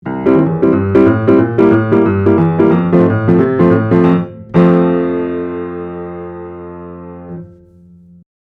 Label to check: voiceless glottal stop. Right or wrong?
Wrong